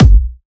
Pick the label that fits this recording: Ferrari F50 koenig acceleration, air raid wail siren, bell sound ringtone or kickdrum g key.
kickdrum g key